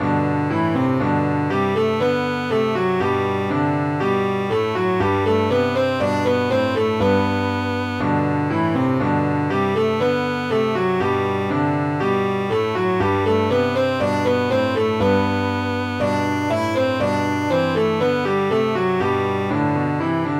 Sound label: musette, cello; suzuki cello school